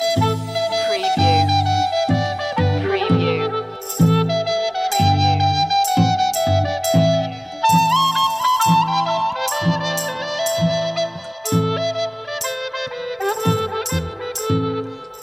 mountain lake loop

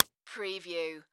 Face Slap; Sound Effects Bible

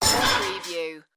robot servo malfunction

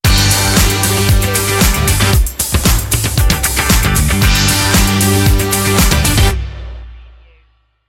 mercury stinger

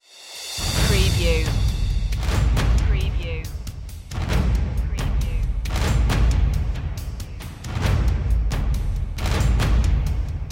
perc mix

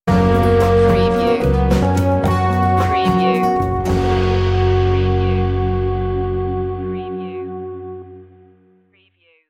successful start stinger

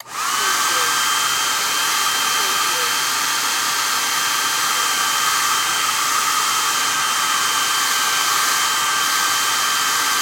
Hair Dryer On Run Off

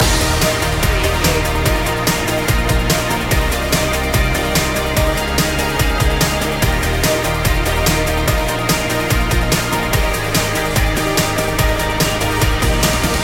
fresh energy loop

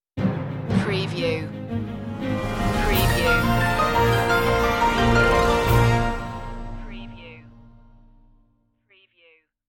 news stinger

